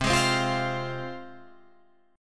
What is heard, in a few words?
C Suspended 4th Sharp 5th, Guitar, Drop D; down; chord shape